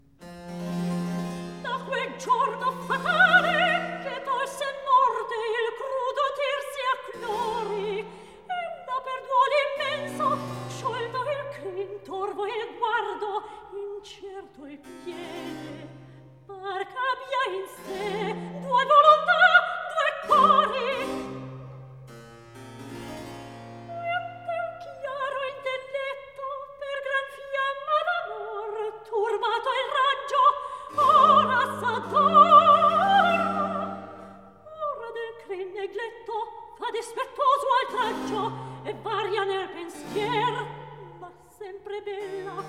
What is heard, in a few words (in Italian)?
Recitativo; Delirio amoroso HWV 99; Da quel giorno fatale; Handel Masterworks; Klassika